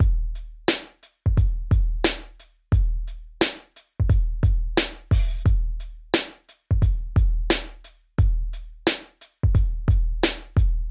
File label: Hip Hop Beats